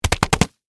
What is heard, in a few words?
Bullet Impact Flesh, Rapid, Fire; Warfare; Blast Wave